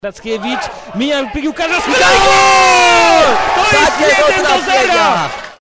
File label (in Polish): 3 gol; Znicz Rybnik